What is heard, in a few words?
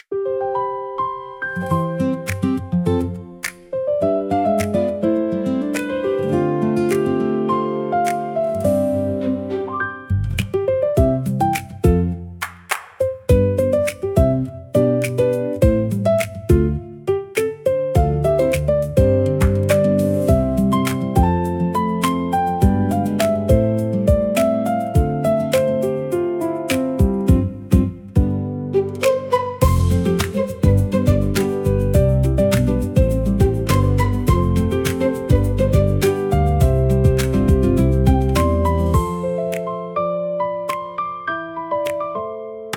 bright welcoming; guest intro